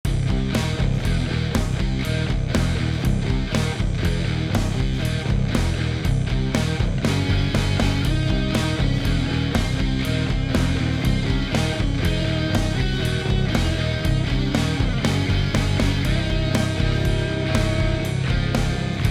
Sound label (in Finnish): riffailua